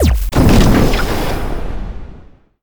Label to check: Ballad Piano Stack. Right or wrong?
Wrong